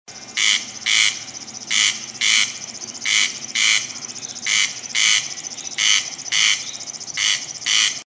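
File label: corncrake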